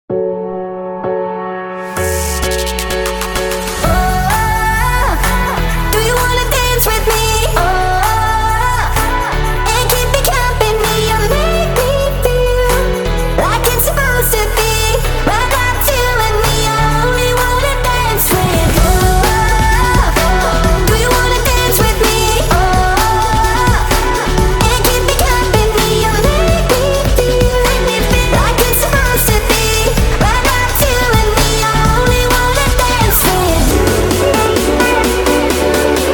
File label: kick series